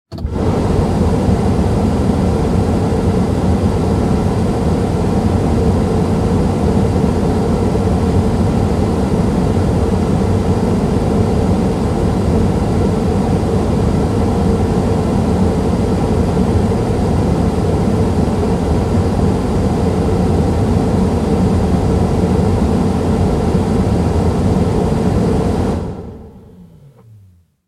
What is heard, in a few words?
Auto Heater Compact Interior High